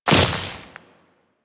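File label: Step Vegetation, Footsteps; Warframe; Grineer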